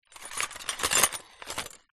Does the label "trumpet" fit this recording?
No